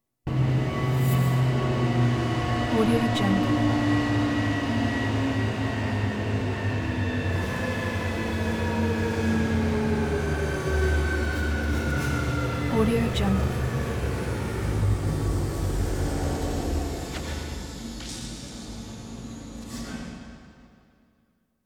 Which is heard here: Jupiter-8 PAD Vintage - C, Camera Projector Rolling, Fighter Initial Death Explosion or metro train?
metro train